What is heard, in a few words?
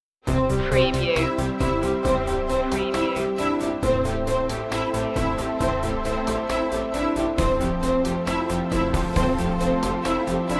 ambitious